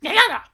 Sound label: rage; Hollow Knight